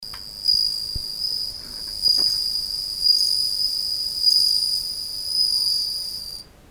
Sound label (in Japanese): suzumusi